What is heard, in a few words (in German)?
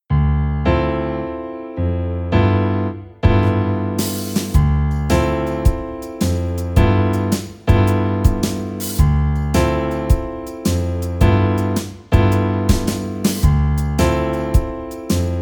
Play-Along SWING